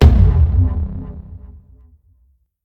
taiko low; smite; raijin

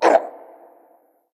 hitreact human male small; smite; npc; roman archer

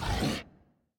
grunt; fenrir

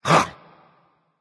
grunt jump berserker; cuchulainn

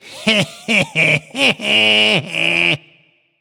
nv tongue; cupid

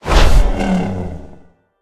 boar drop; smite; god artemis

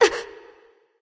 surprise; smite; voicepack; hel

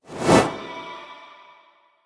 basic swing; smite

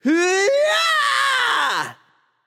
battlecry; smite; tsukuyomi

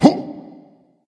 grunt jump dragon; fafnir

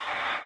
Breath In; smite; god bakasura

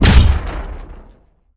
stun; smite; lancelot

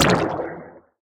staff hit; smite; ahpuch skin10

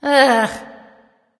ugh; smite; artemis

